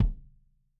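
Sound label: KICK MED MED KICK, analog recorded drum; Sonimus; n series drum pack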